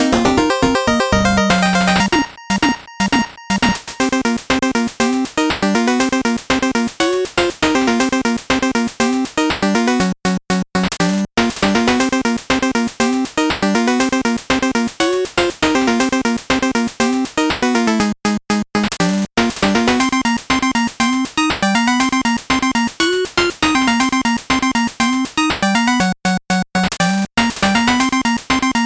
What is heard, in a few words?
8bit bgm slow